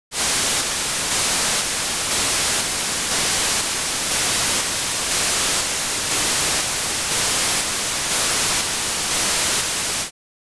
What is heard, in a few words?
noise LR corr alt